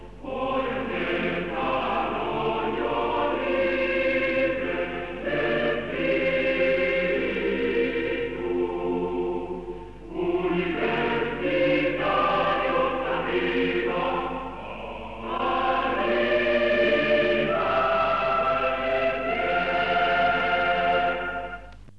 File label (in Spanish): himno